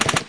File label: machinegun